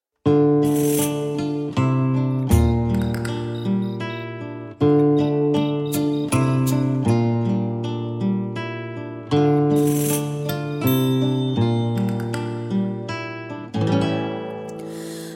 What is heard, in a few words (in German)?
Arrorro wiegenlieder